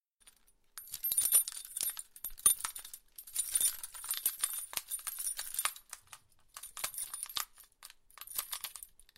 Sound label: jingles